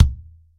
thick kick sound c sharp key

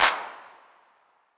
clap one shot c sharp key; single hits one shots

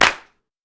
clap g key; snare drum alternatives

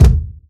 hot bass drum single shot d sharp key; kick

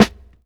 snare drum g sharp; snare drum samples; one shots

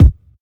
raw steel kick drum a sharp key; kick drum samples

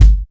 fluffy steel kick drum sample g key

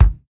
80s round jazz kick sound g sharp key

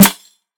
crunchy acoustic snare sample g key; snare drum samples

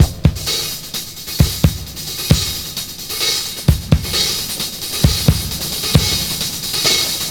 132 bpm breakbeat sample c sharp key

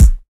good kick single hit a key; kick drum samples

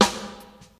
present snare sound g sharp; snare drum samples

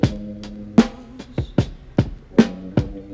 76 bpm drum loop sample C# key

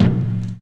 mid heavy kick one shot, B key; kick drum samples

